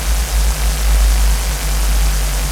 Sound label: Arc Nova Diffuser Charge Loop